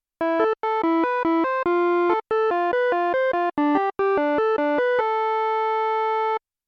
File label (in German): nur Osc; Lead-Sound erstellen am Synthesizer